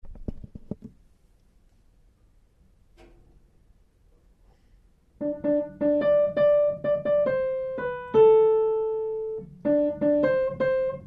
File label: Melody